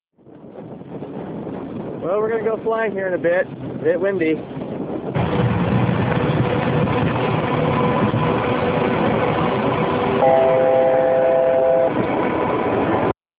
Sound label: plane ride